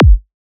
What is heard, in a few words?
Kick